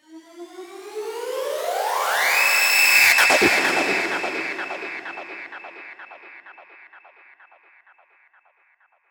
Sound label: Sweeps Medium